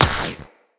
Shield Impact; Tenno

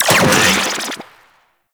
Shock Rifle Primary Shot 3D, Weapons; Warframe; Corpus; Shock Rifle Vandal

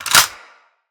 Weapon Jam Slide; Weapons; Grineer

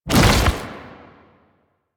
Tank Infested Arm Move; Lasrian Tank; Movement

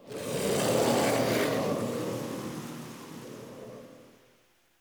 Ext Wind Gust Calm Toxic Throat; Warframe; Infested Eris

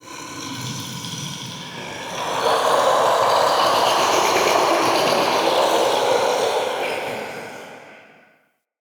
Male Vocal Idle, Enemy; Mockery; Mockeries

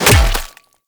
Melee Hammer Impact Flesh; Hammers

